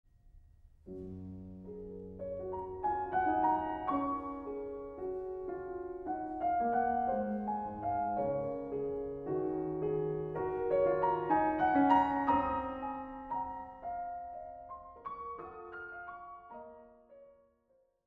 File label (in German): Variationen b moll